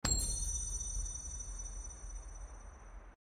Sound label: Claim Reward